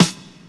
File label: SD HOU, Dance Mega Drums; Best Service